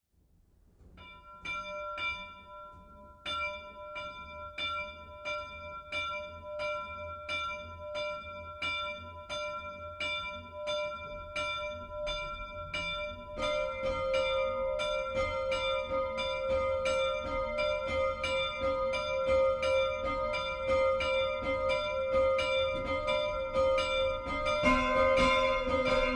Martinskirche Vollgeläut; Greding

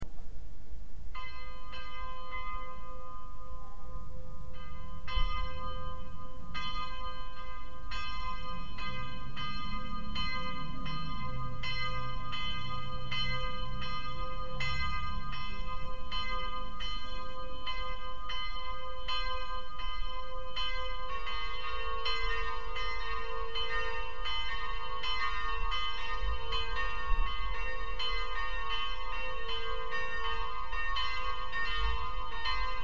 Herford Münster Plenum

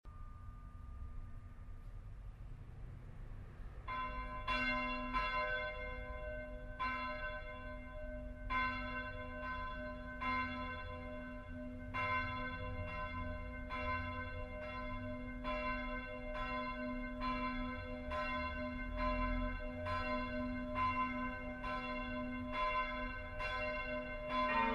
Plenum, glocken

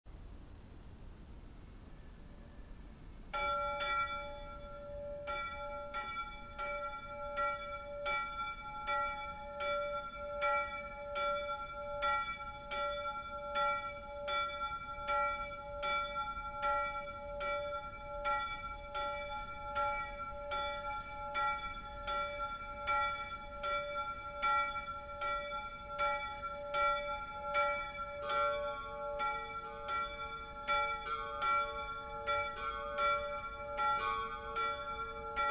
Plenum, Glocke; Merseburger Dom

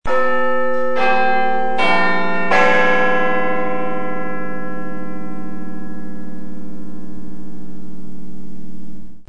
Big Ben Viertelstundenschlag

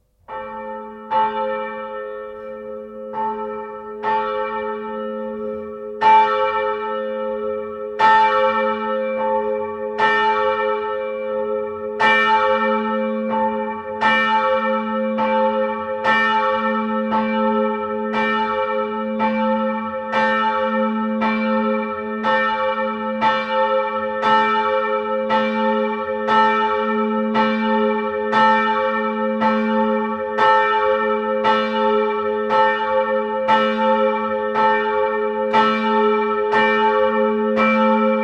Evangelistenglocke; Bobstadt

